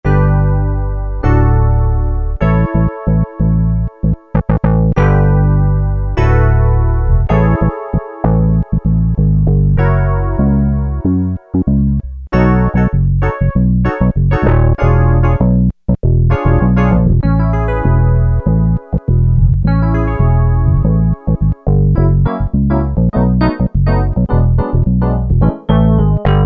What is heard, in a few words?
E.Bass -E.Piano, Roland MKS-70